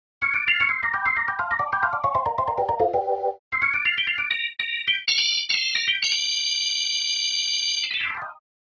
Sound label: Hammond B3 Mode B3 High Fast; Master Hammond B3; Syntheway